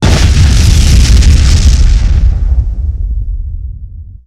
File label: Building blast up-close